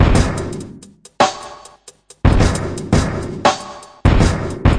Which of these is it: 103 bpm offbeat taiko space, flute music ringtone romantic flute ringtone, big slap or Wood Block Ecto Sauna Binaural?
103 bpm offbeat taiko space